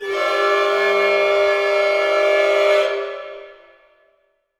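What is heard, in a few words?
orchestra; Masterbits - Switch FX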